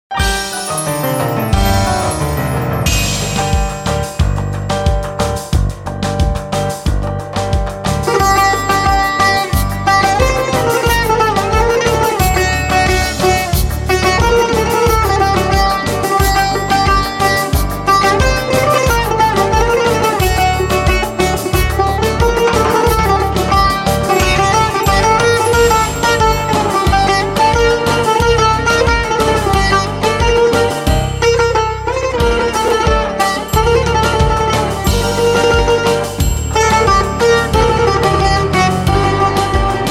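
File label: Setar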